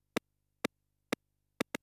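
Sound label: percussion, house, 125; eJay; Drum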